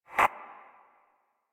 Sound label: Possessed Red Veil Vocal Death; Enemies; Warframe; Priest Quest